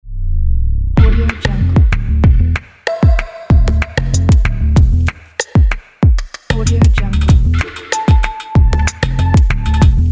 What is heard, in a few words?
energetic stomp bass ident